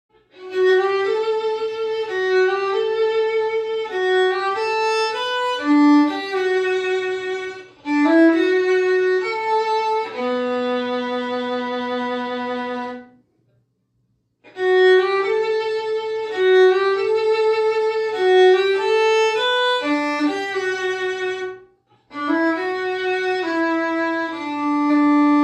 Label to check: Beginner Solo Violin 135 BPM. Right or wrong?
Right